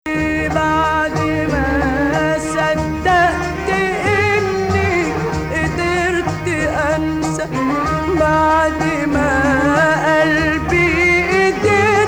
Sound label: Rast (true) Rast5